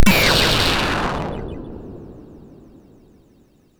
MOS6581; Demogame Example

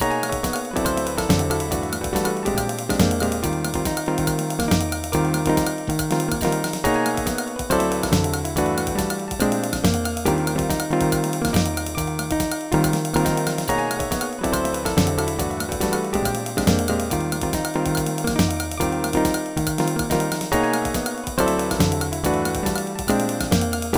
solo; Dr. T's MIDI Recording Studio

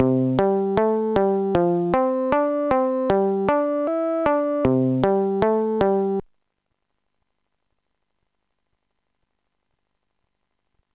bass; Sonix Music Driver

